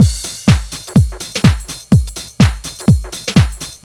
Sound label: backyard beat, Deep House 125bpm